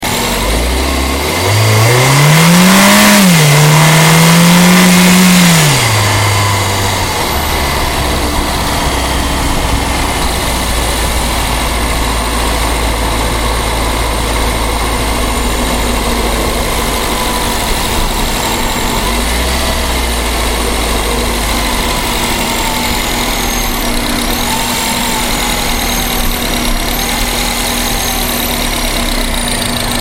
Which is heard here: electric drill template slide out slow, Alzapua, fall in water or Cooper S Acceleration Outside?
Cooper S Acceleration Outside